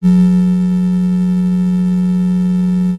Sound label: science fiction door buzz drone slide